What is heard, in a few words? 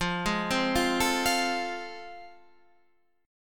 A Minor Major 7th Sharp 5th, F